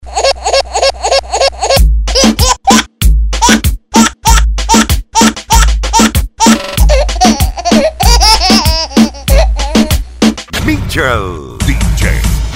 baby rap

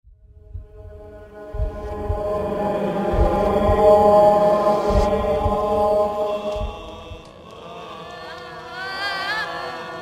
halloween terror; halloween sms

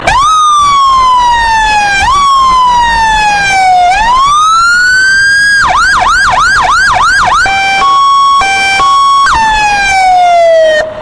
fire engine siren

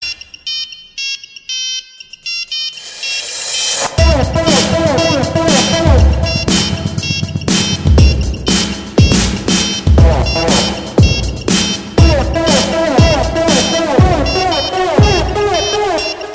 nokia cool remix

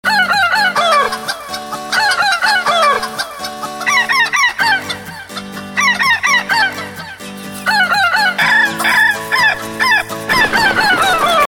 ringtone

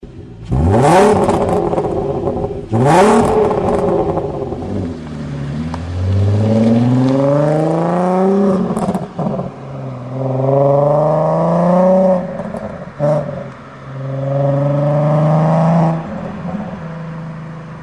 golf r32 exhaust, engine car sounds

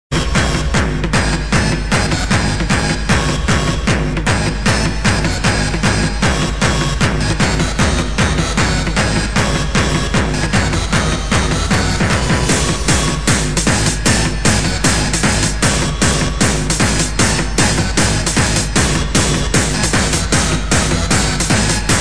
piano

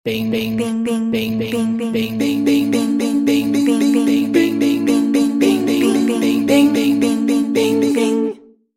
bing tone

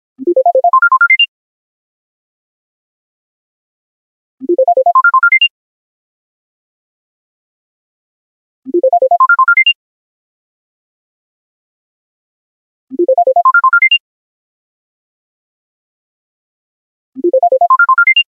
bubble up

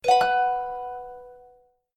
notification whisper von Samsung

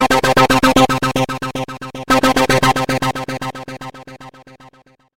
sms tone

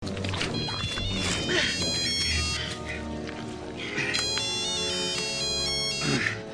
jurassic park 3 phone

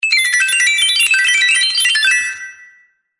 shiny tone

